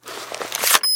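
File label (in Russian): извлечение пленки из фотоаппарата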